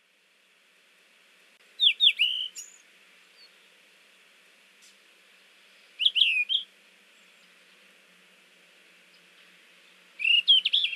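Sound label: Yellow-rumped Flycatcher, hyogo; mamijirokibitaki; koe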